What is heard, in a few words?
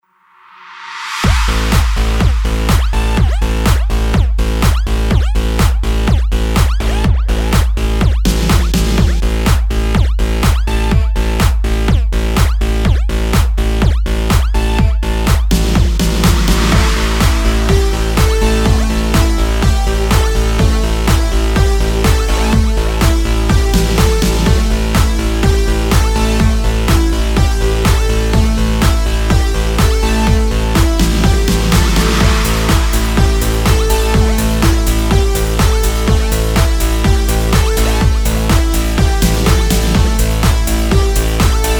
Promotional HighTech; MIUI12